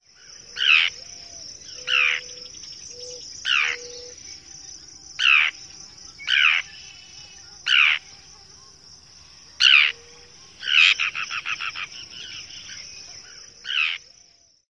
typical calls

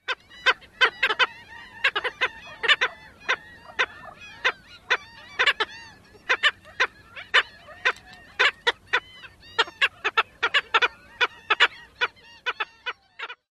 typical barks at colony

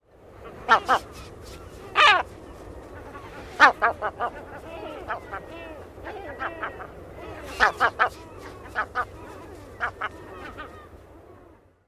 alarm mobbing calls

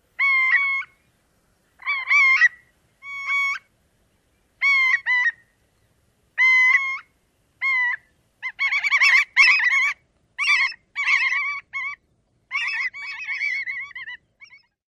willet typical calls

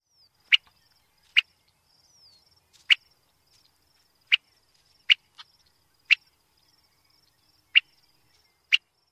quit calls

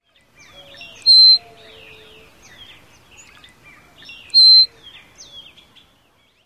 whistle call; TX

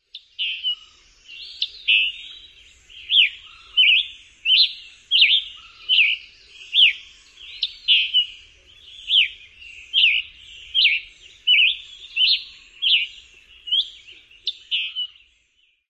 dawn song; KY

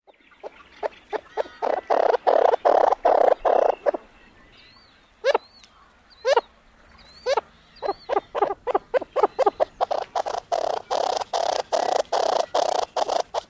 churring songs & calls

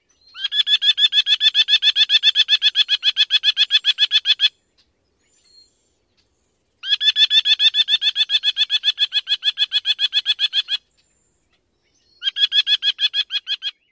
Merlin female alarm

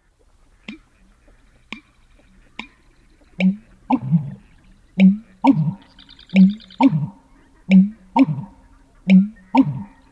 onk-a-chonk

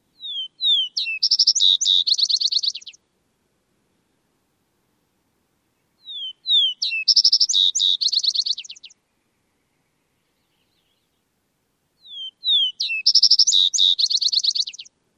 songs

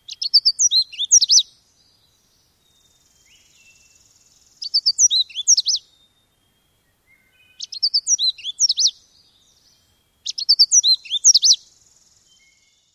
songs; NY

